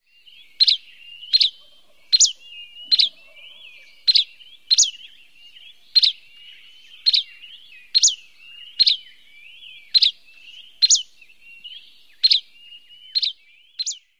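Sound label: Buff-breasted Flycatcher dawn song; AZ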